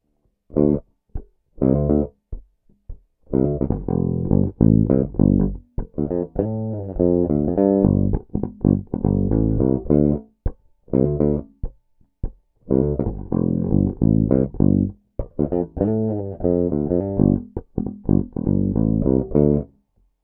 Humbucker Bridge Pick Tone DOWN; peavey shootout audio samples

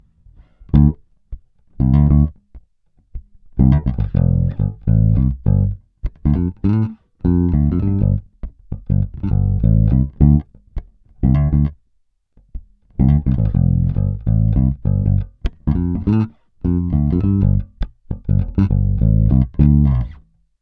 Peavey Stock Fingerstyle Tone UP; peavey shootout audio samples

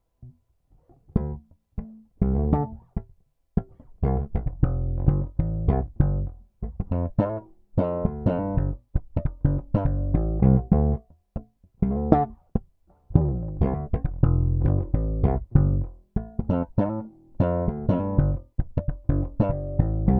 Both Slap Tone DOWN; peavey shootout audio samples

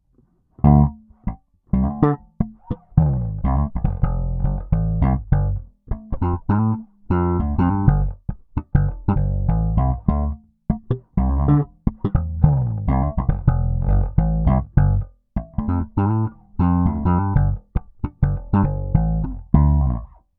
Peavey Stock Neck Slap Tone DOWN; peavey shootout audio samples

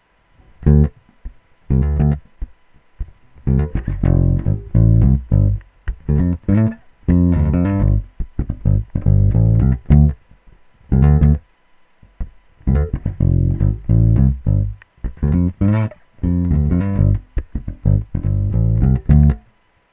Super Neo Neck Fingerstyle Tone UP, peavey; Peavey based pickup Shootout

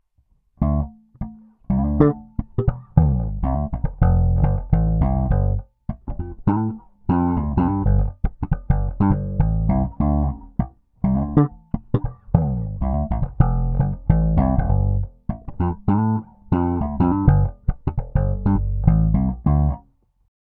Humbucker Slap Tone DOWN; Peavey based pickup Shootout

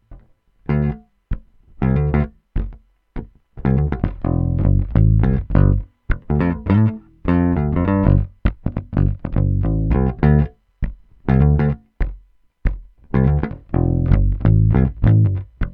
Neck Pick Tone UP; Peavey based pickup Shootout

